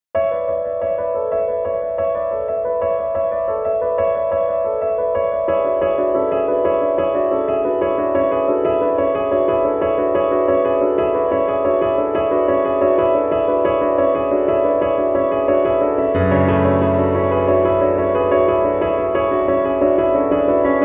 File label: Modern Classical Romance